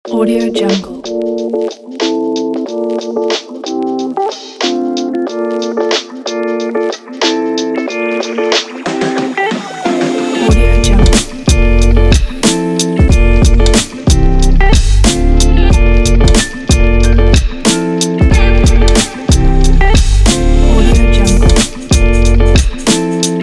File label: chill hiphop instrumental